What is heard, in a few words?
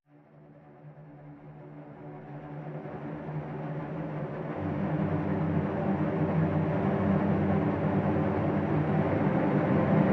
Jungle Suspense Strings; Far Cry